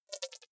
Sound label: click erratic wide buzz, signale